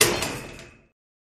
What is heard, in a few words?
Gate Metal Bang